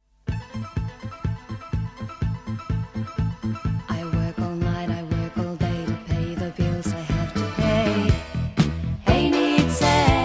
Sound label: cadences